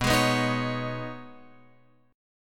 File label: B 6th Add 9th B down Guitar Open F; strummed